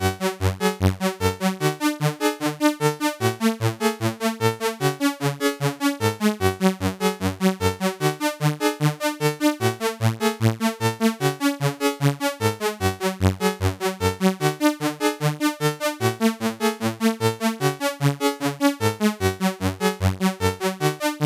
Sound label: c64 in game; lazy jones